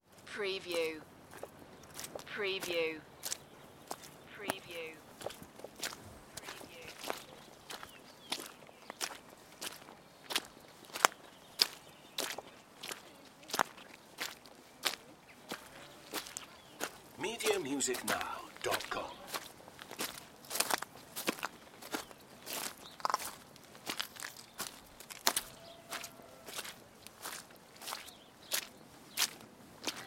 Footsteps on wet pebble beach with people and traffic background; beanstalk audio